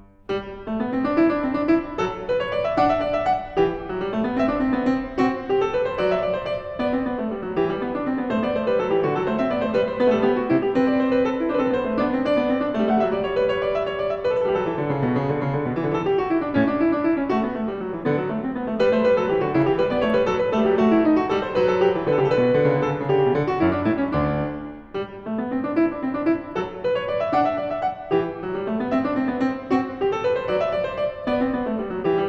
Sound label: Variation, Canon on the ninth; Bach; Goldberg Variations, BWV 988